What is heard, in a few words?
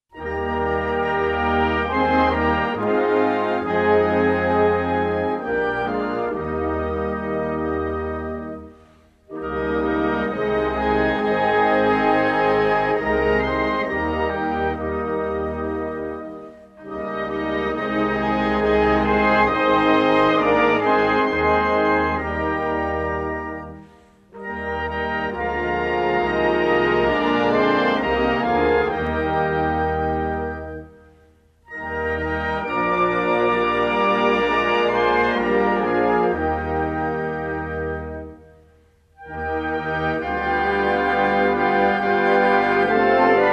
czech republic, anthem; nationalhymne